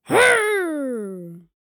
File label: Young boy playful grunt